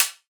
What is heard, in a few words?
Acoustic Drums - Closed Hat; Heavy Acoustic Drums; Antidote Advent